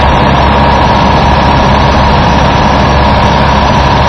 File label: Fast American; 暴力赛车